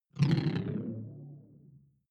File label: sci-fi alien big bionics; swooshes-swishes